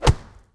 BODY HIT, FIGHT; 1000fx

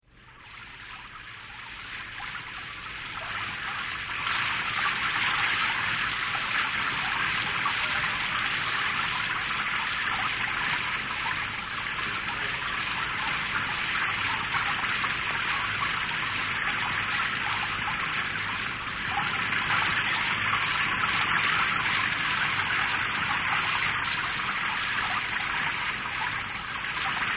Raining; SOUND EFFECTS1

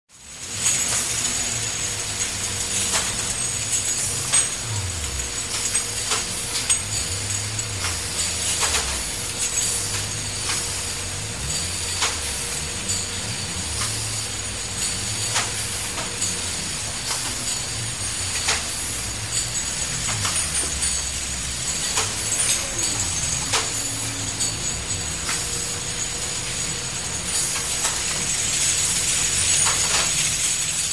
Bottling plant; SOUND EFFECTS1

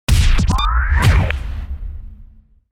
Launch Hit; DJ sounds

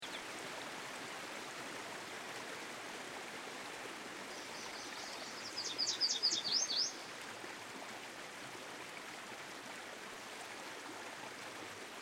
Yellow Warbler, Songbirds By The Stream; Dan Gibson

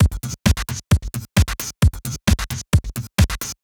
STRIKE DRUM LOOP; Alien Imaging; STRIKE FX

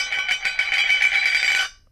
CAN CAP, KITCHEN; 1000fx

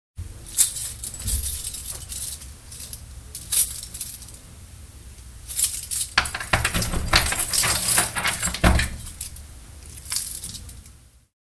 Keys Un Lock; 100 sound effects

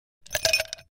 Ice Clinks in Glass Without Liquid

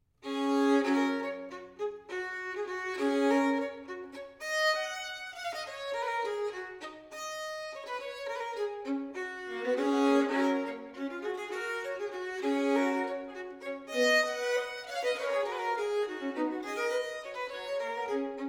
English Country Dance - Traditional; Newcastle

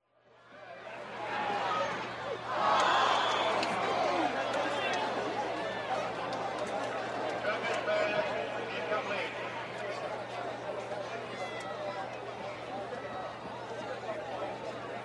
Football Game; SOUND EFFECTS1

